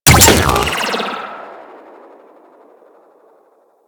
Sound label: Shock Rifle Alt Shot, weapons; Warframe; Corpus